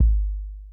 Kick Hit; big fish audio; Hip Hop Exotica; Cmin

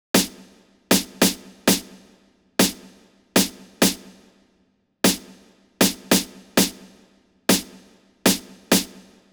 Snare; Hip Hop Exotica; big fish audio; Apple Loops; 098 Bmin; Drum Tracks

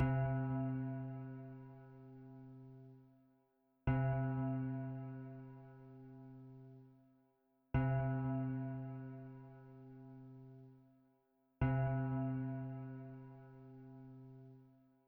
124 BPM C Min Bell; Cymatics; Destiny Gold Expansion; Mount Fuji

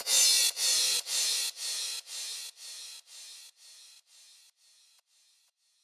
Crash, Big EDM Drum Loops 120 BPM; Future House; Future House Tools

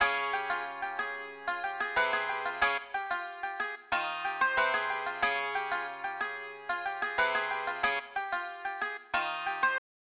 Tzouras, 092 Cmin; Hip Hop Exotica; Apple Loops; Big Fish Audio

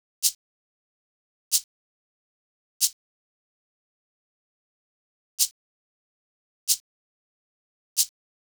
Shaker; Hip Hop Exotica; big fish audio; 093 F#min; Drum Tracks

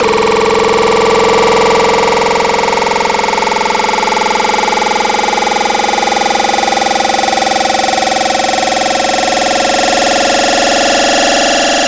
Vocal Puke; Wavetables; Cymatics; Vomit for Serum Vol 2

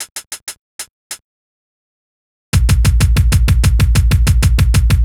Drums, 095 Cmin

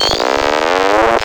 Dolphin, Wavetables; Cymatics; Serum; Animals for Serum - Gold Edition

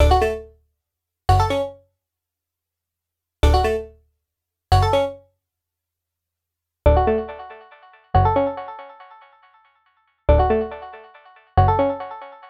140 BPM F# Min Pluck; Cymatics; Destiny Gold Expansion Stems; Cherry Soda

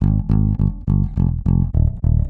Bass A, 104 Cmin

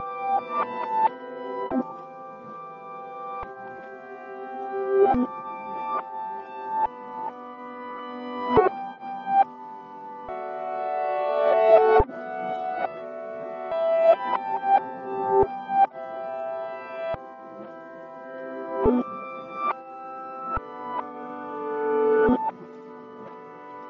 140 BPM E Min Piano; Cymatics; Destiny Gold Expansion; Chardonnay

